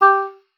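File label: oboe d'amore G4; Xsample VOL-7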